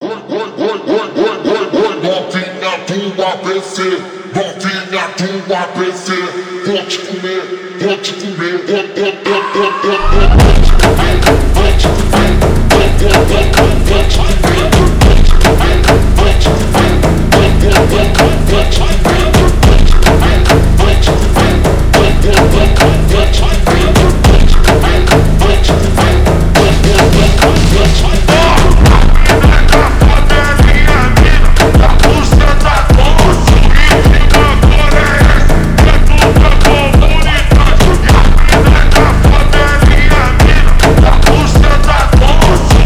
BRAZILIAN PHONK

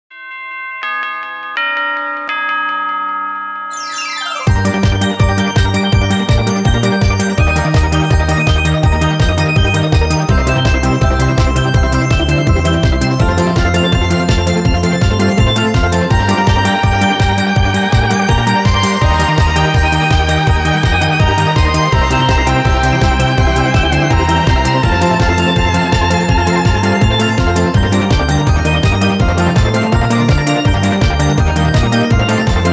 Victory Music

